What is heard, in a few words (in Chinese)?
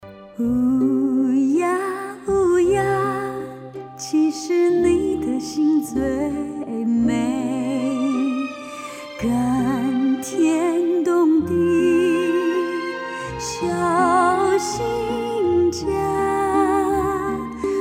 原创歌曲片段